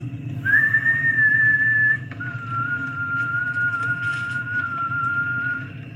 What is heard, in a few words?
whistle short